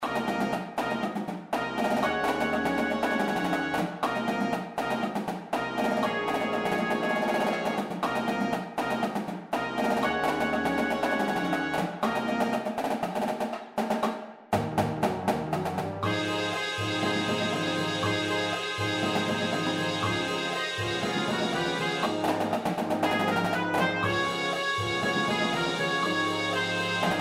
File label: Jingle Bell Jazz